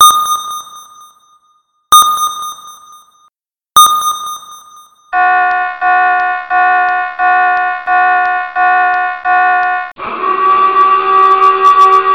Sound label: Ping alert dive; meloboom